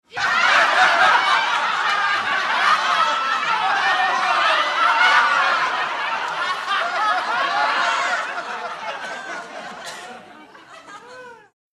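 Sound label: Crowd Bursts Laughter, medium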